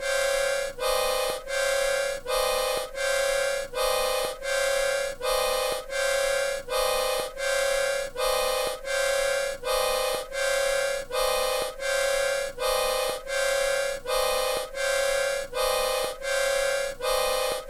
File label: accordion squeeze